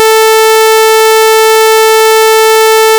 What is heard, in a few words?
Sawtooth Wave; Basic Waveforms; Audio Synthesis